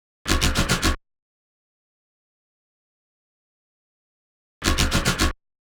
Drum Loop 110 BPM Rumble; Experimental Drum Loops; Loop Stems; Cymatics; Mystery Pack Vol 4

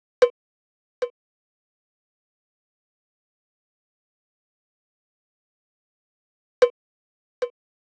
Nostalgia Drum Loop 150 BPM Percussion; Cymatics - ANALOG EVOLUTION Beta

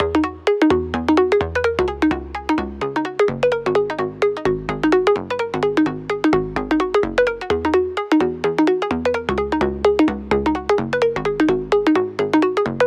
House Arp - 128 BPM G# Min; Synths; Loops

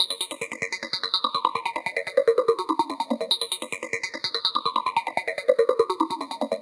Opal F 145 BPM, Psytrance Arp Loops

